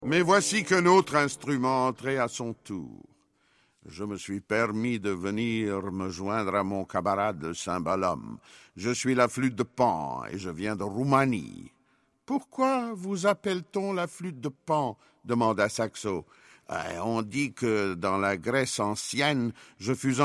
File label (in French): roumanie flute pan; passeport piccolo